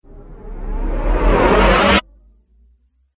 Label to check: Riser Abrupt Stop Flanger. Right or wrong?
Right